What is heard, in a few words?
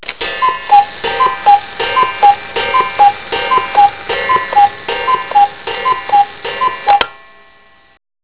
Cucoo